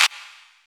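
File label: deep house clap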